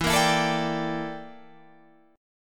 G Minor 6th Add 9th E

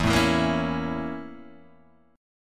Bb Minor Major 7th Flat 5th

